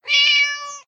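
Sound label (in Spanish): gato miau, cat meow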